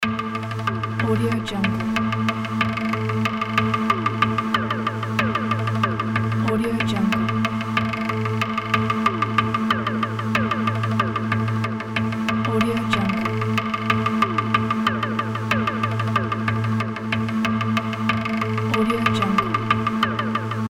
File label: game background loop; phillipmariani